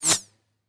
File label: bullet flyby pistol close; Rust